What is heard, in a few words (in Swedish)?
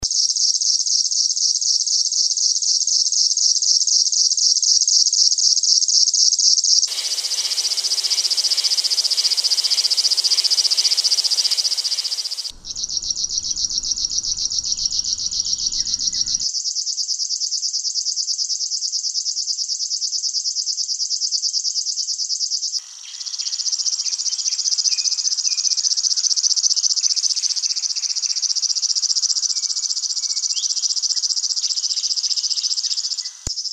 flodsangare; birds; flodsangare clips